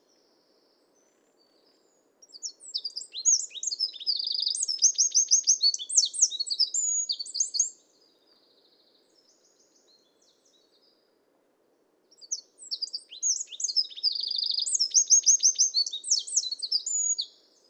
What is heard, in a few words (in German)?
Zaunkoenig Gesang